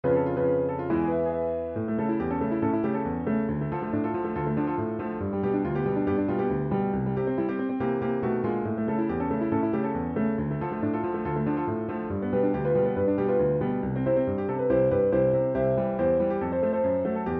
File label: Air Platform Theme; supernintendo; Super Mario World